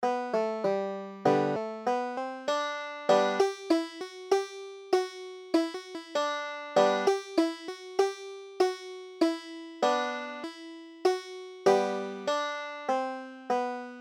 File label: first noel, banjo